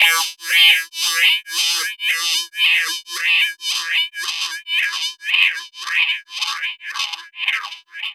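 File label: SYN 1, 112 bpm, Funk; USB Soundscan vol.41; AKAI; Roots Funk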